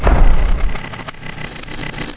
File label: door move